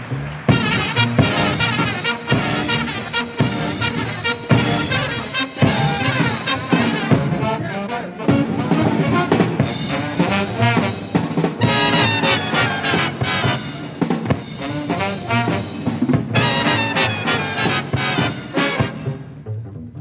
one bass hit

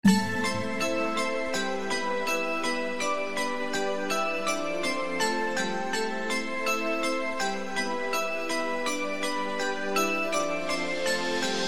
bells vibes

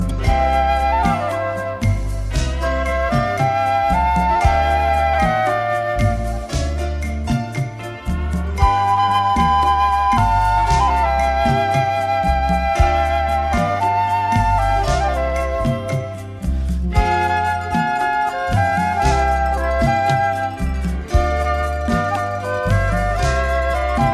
amazing flute

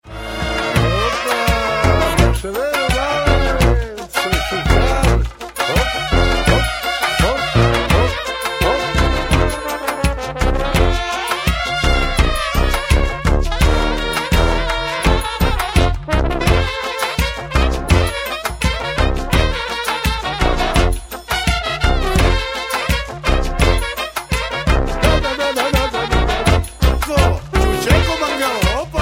serbia trumpets guca

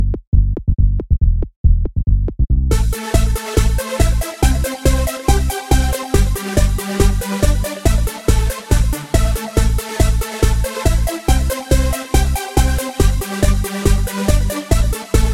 techno re build

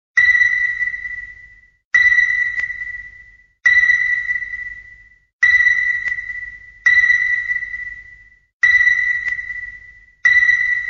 sonar ping long

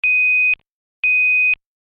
motorola iden

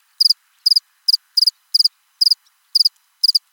cricket

